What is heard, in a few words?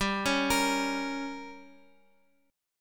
G Diminished, Guitar, Open F; arpeggiated; x,x,x,2,4,5; JGuitar